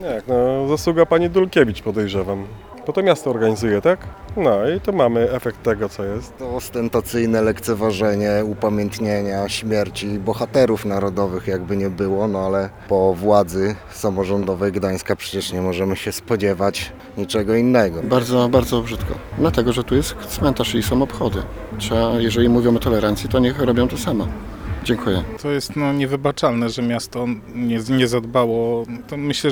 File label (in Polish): techno; nad grobami